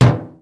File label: metal; Mafie 1